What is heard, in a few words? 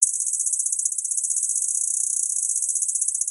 criquets locusts